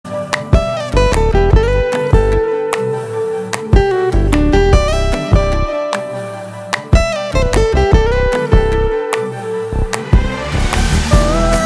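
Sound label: background music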